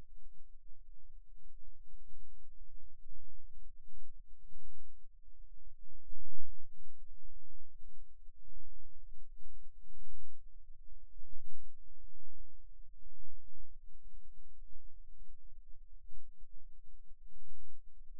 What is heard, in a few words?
Rhythm Wave, eeg seizures